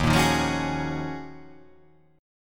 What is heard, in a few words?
D sharp Minor 11th D sharp, chord